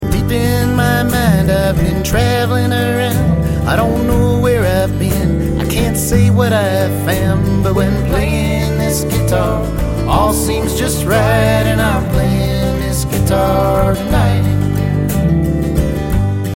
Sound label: playing this guitar